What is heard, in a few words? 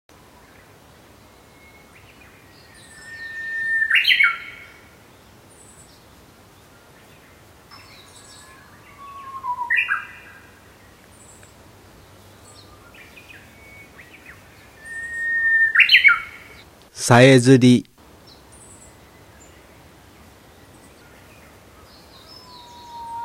bush warbler; bird; azamidani